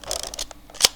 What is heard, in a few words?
Camera Shift